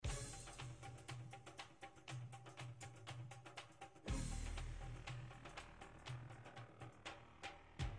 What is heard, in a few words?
pakistan bgm